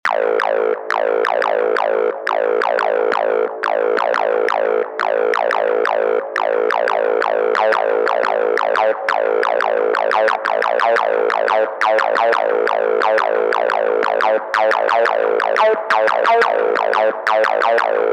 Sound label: ACID C